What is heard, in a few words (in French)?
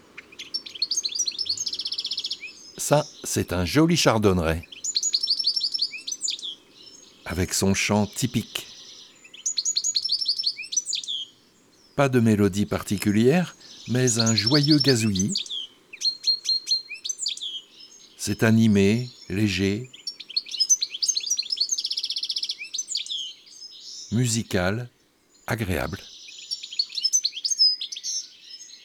Fevrier Le Chardonneret elegant